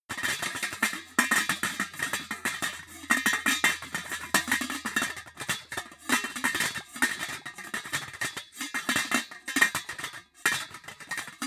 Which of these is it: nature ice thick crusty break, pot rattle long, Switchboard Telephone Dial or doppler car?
pot rattle long